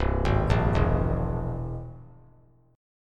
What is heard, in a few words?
C Augmented 7th C down 3C, 3F, 3A, 3D; arpeggiated; JGuitar